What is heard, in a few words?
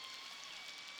Background Noise Clips